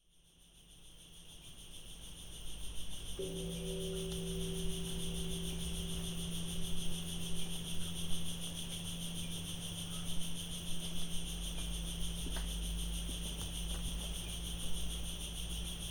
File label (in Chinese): 阿里山 暮蟬鐘聲